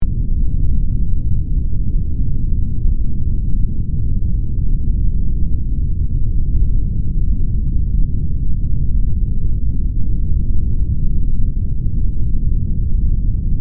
Earthquake seismic activity deep low rumble loopable